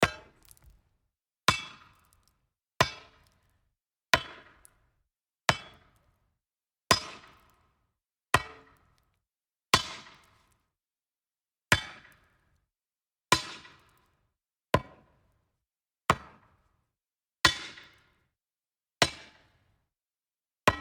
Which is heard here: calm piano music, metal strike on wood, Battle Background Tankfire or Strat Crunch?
metal strike on wood